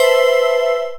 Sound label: dance chord